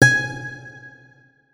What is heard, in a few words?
notes, guitar; Hideout Furniture